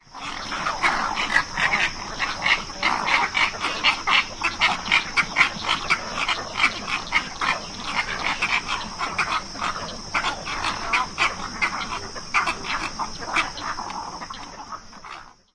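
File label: Cattle Egret colony sounds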